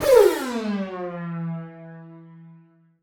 Siren Horn down E, future rave, Siren-Horn Type Hits, Ramp Down